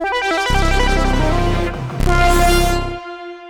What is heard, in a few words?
Tech gaming, sonic branding